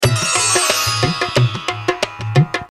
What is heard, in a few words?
tabla Dholak